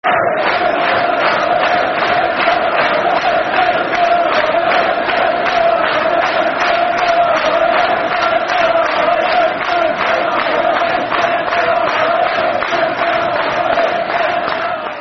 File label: Football Chant (Soccer Song), Man United; Red Army